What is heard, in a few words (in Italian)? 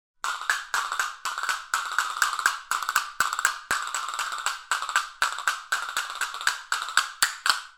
Nacchere; olofonia